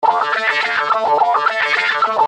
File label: Nasty Gtr D